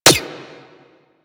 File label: Rifle Mech; Crp Ruban Rifle